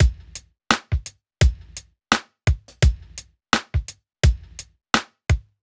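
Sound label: 85 Drum Loop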